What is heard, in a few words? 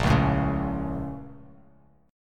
A sharp Minor Major 7th, Bass, chord; A#mM7 strummed; Bass Standard; JGuitar